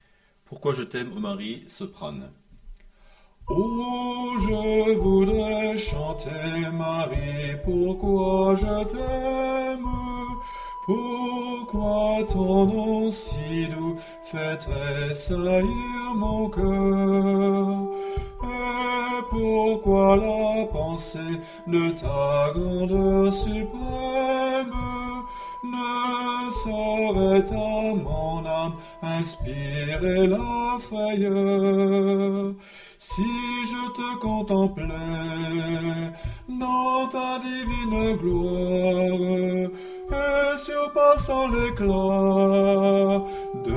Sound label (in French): Pq je taime Marie soprano